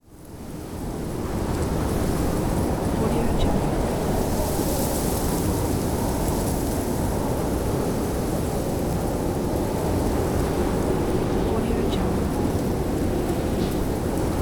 wind

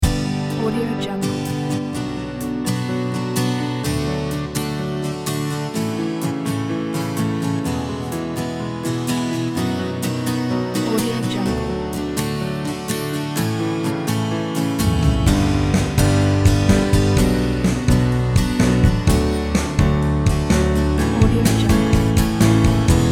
thirty seconds tv ident; karluca

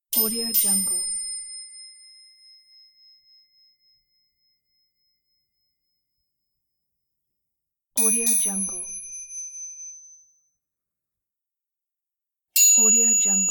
bike bell rings; efxideas

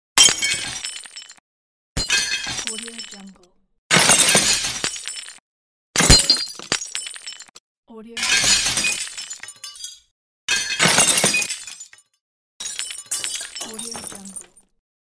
glass breaking

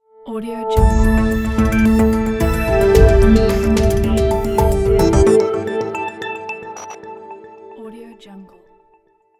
news logo

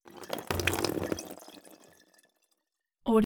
waterflow; soundbits-soundfx

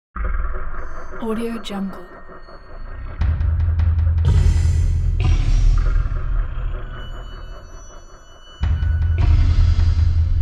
trailer

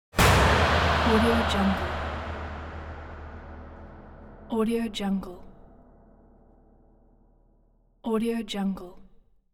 horror impact

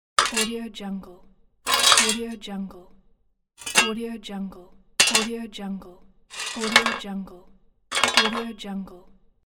metal garden tools

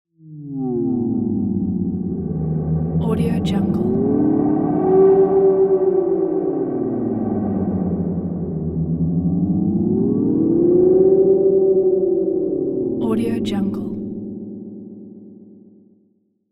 cinematic elements swaying drone

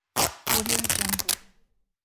ripping jeans

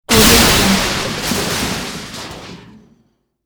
hard metal crash; sound-ideas

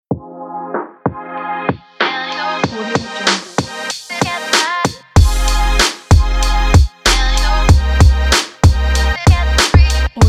hiphop; phoenix-sound

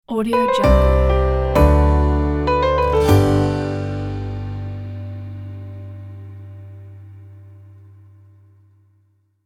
elegant piano guitar logo

